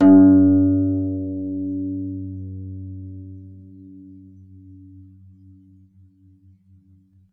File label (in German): HAKENHARFE; SoundBytes; Early Patches